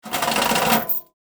Steel chains scraping metal

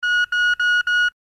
Metal detector searching beeps; foley

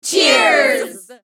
Female crowd celebration cheers

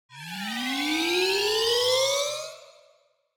Anime riser accent

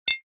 Simple notification alert

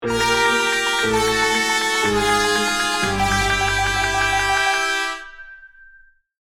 Comedy cartoon wah wah wah fail trumpets

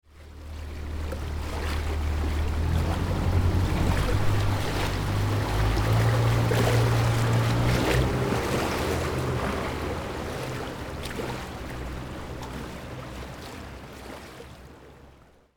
Motorboat passing by distant perspective

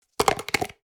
Plastic food container close

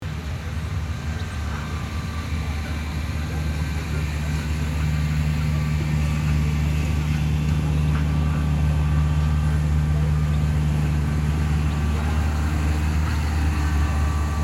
Rural farm tractor ambience loop

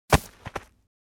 Object falling on a dirt surface hit impact

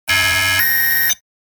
Medium glitching noise burst

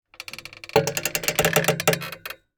Metallic swing stress and pressure texture

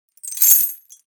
Light chains handling

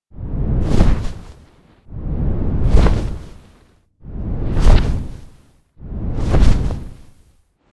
Dragon wings flapping movement multiple

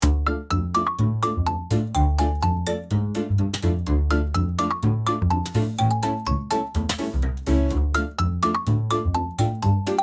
Funny meme moment not so serious

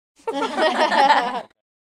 Kids laughing short

